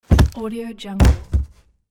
cinematic falling body

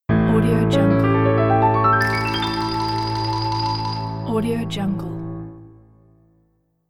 cartoon logo; robert kincaid

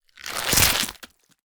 gore rip flesh; soundmorph

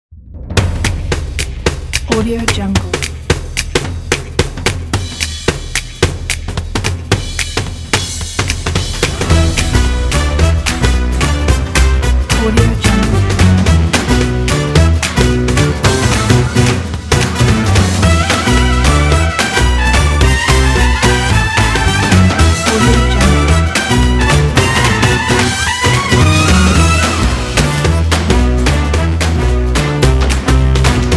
big band jazz pack

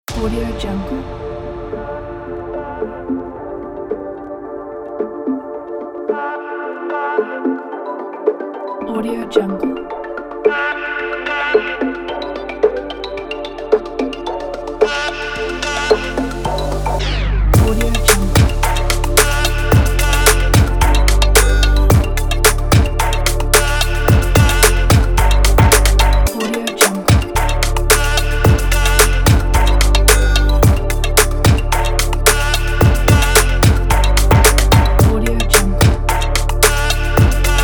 energetic dance pop

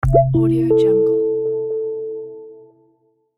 soft boot login

